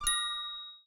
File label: new Coin